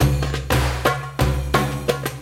spingle Drum Loop; wild bazaar

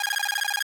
countup Loop

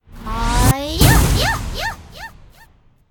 Pink Heavy Attack; wildworlds

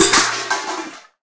pot hit; hellskitchen

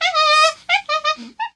monkey climb; rage of the seas

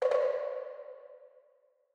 nudge; rise of maya

